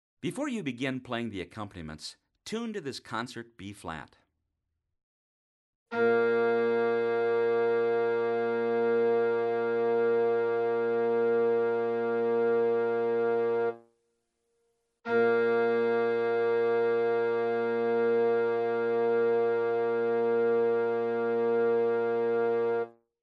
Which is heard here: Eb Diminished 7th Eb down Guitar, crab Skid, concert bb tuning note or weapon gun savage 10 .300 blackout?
concert bb tuning note